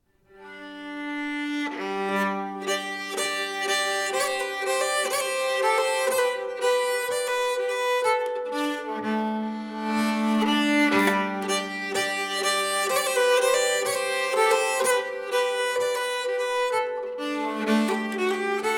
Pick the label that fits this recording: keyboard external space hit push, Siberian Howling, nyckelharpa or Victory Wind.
nyckelharpa